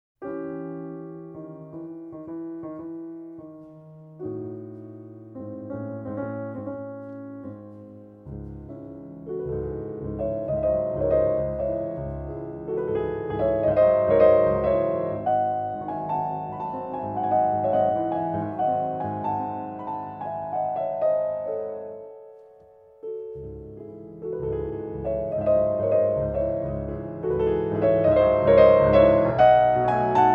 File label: Mazurka in cis moll, piano; F Chopin; no.4